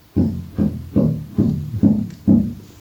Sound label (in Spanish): Tuba slaptongue aireado percutivo mf